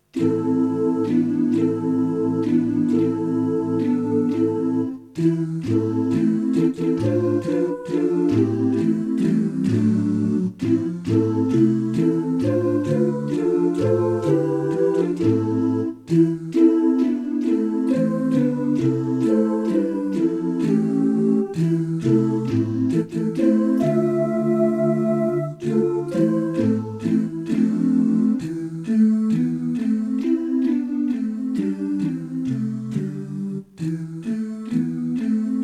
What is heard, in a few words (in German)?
ohne Click